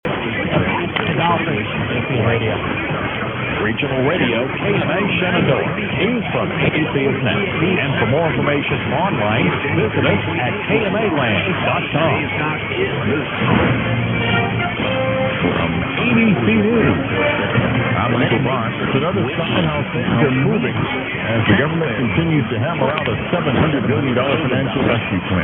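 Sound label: AM radio station, Iowa; 960; mw dxing; kma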